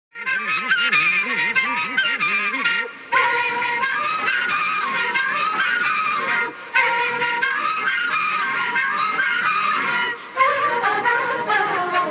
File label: furulya